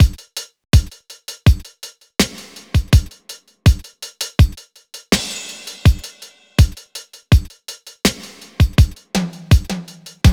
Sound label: drums AC, Smooth RnB; Big Fish Audio; Legendary; Shes Mine